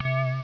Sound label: ring